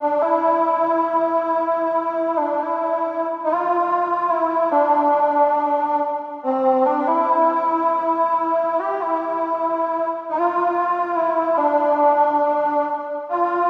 LEAD

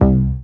C Clean Bass

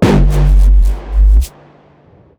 bass one shot G; banger

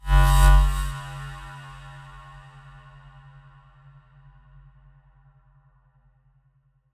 Coil F m

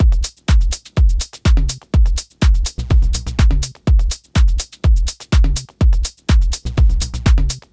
drum loop 124 bpm

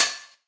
Elastic Hi Hat

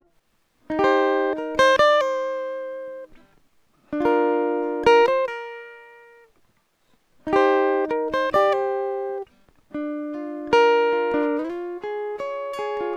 Acoustic Guitar 74bpm Gm Jazz Folk

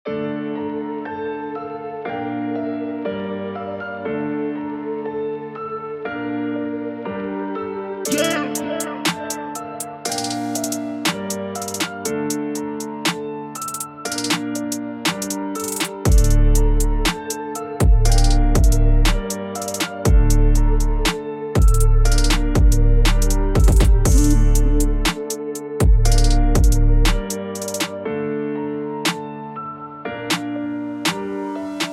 Piano Trap

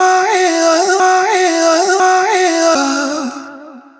120 Vocal Adlib Vibey Loop F-m

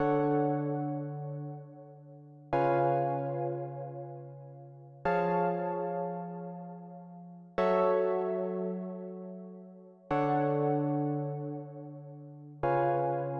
Chords 95bpm F min; Blade Runner

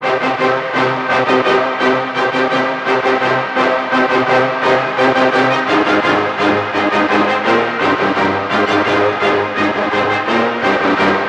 170 horns big Cmin; songstarter; bombrasstic

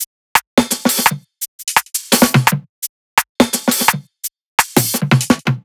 170 Drum Top

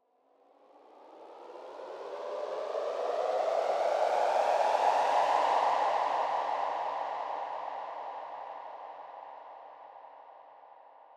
D&B Riser

